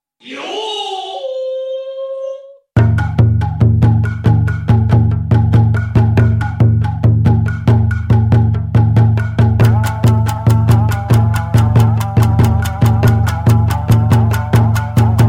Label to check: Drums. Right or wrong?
Right